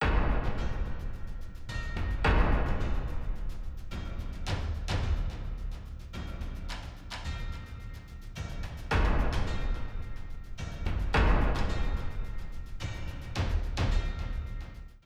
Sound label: drums test; bgm